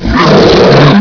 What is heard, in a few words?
zo alert, zombieman; svencoop; classic